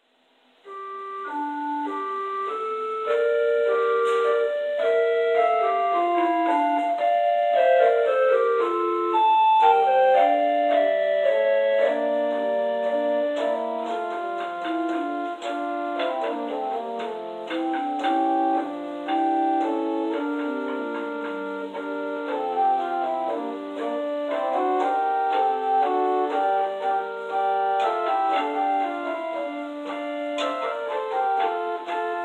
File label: Interlude